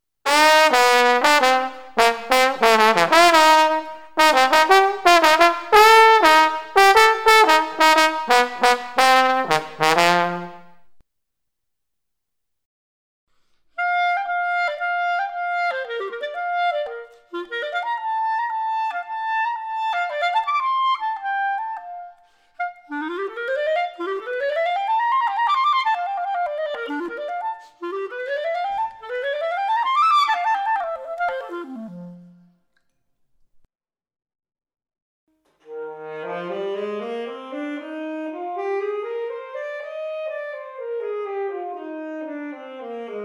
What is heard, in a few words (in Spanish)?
instrumentos de viento